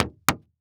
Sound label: Foley Footstep Hard Sole Shoe Jumping On Solid Wood Platform; Foley Footsteps